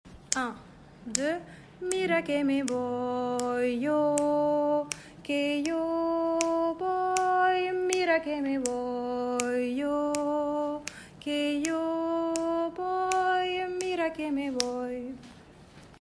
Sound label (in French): basses